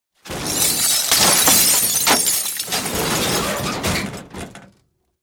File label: Car Impact Large Metal With Glass Smashing Shatter